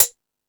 Beat Box; E-MU Pro-Cussion

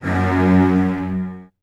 Virtuoso Strings; ILIO; Kirk Hunter; CL 16TH

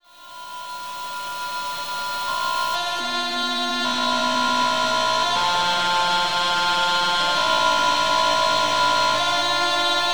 Dischords; Sonic Foundry (Sony Creative Software); Loop Noir

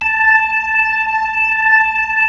B-3, Club

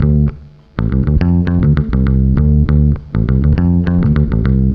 BASS, BASS GTR

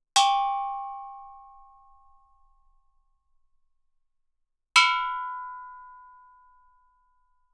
Gamelan; Propeller Island - Complete Gamelan

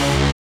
SYN TECKO, Dance Synth; Sound & Vision - Gigapack I CD 2

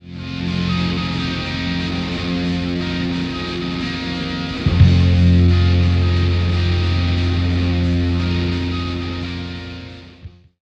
GTR; Roland - LA Composer vol. 2

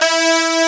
SAX C2; AKAI S-Series CD-ROM Sound Library VOL-2

